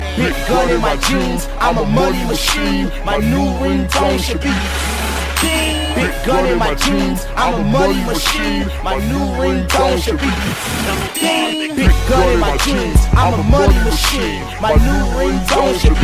Inspirational

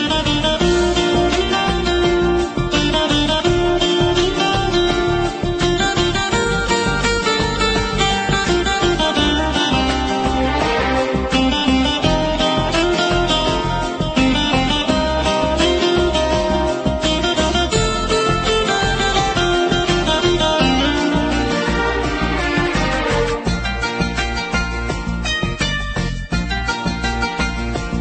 Is Calling Inspirational

